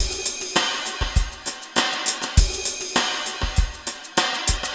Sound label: Breakbeat, Drums & Percussion